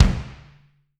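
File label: bass drum, Drum 1 Dry; East Collexion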